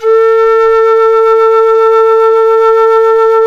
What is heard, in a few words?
KENA A1; World Combo